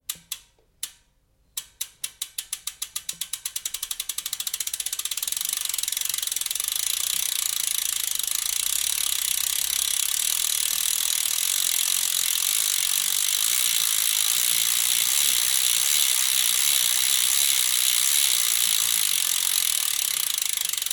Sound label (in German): freilauf tune404; Tune Freilauf (Zipp)